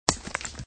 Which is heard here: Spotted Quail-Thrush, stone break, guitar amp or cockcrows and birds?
stone break